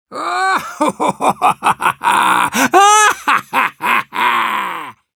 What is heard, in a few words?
Demoman laugh long, ru